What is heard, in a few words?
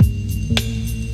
Old School Break, Drums